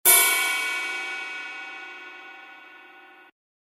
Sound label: Zen Chime